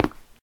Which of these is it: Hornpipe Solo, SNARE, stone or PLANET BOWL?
stone